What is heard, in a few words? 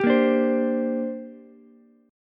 Bb 6th Add 9th Bb Ukulele; Bb6add9 strummed; Bb6add9 Chord